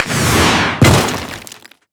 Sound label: soundwave weapon scifi rocket launcher shot explosion; Bluezone Corporation